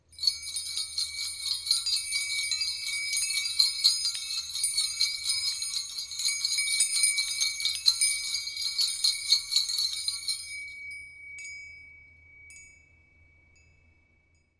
Christmas in July bells

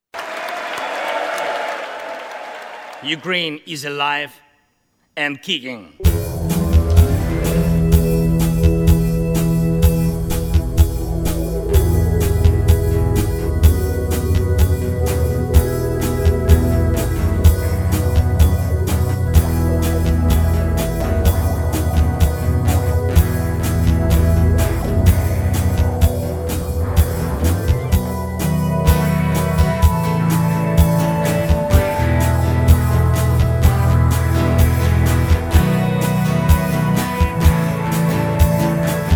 Instrumental

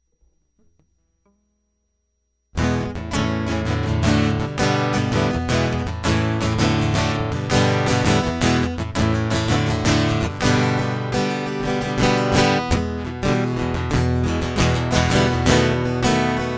This Is a Masochist Guitar Loop

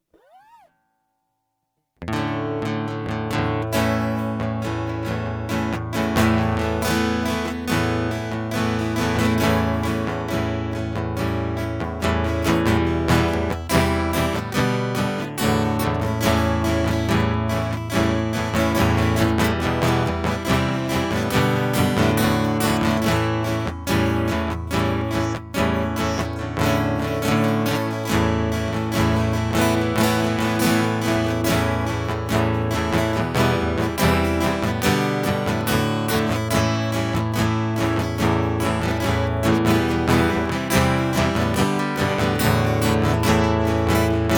Look Up to the Sky Instrumental; Advent

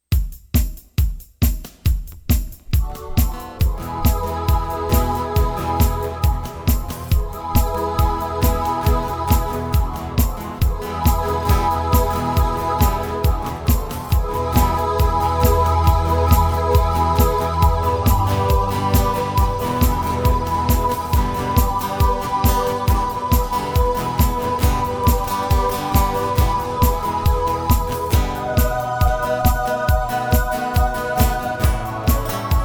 Acoustic Guitar

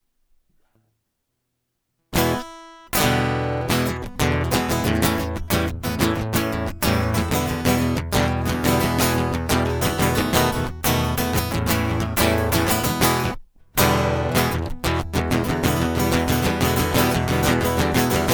Instrumental; Aurora's Song Dance

